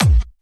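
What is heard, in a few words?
hard bass drums; breakcore; Bazzdrumz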